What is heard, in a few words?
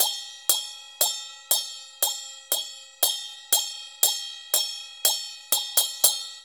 CHNA SM11 R, Heart of Asia; Spectrasonics - Heart of Asia CD2